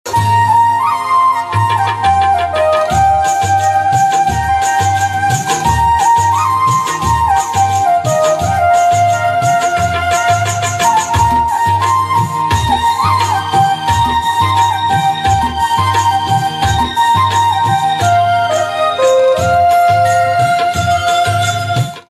Organ Instrumental Ringtone

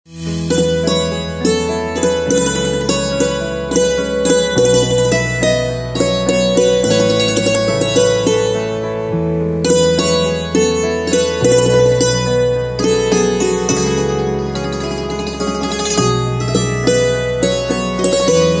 Santoor Instrumental Ringtone

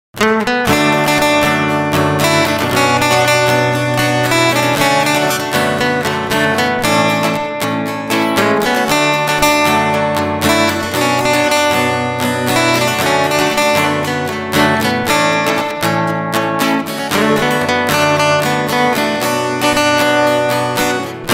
Guitar Instrumental Ringtone; punjabi instrumental ringtone

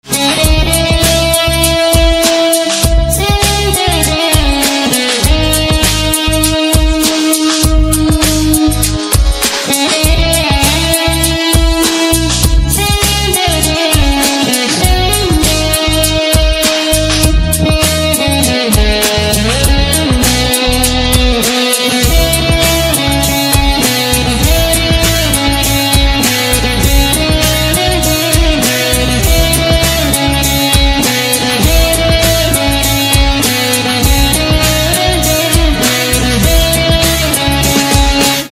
Electric Guitar Instrumental Ringtone

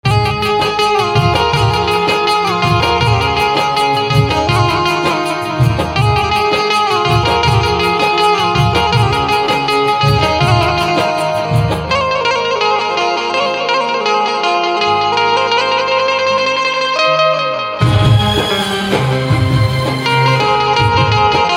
Banjo Instrumental Ringtone

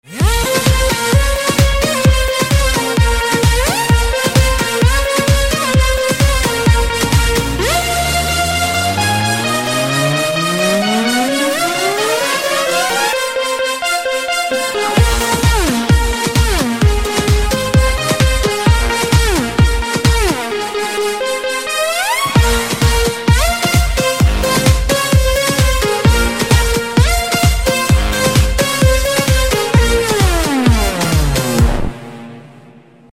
Seaboard Instrumental Ringtone

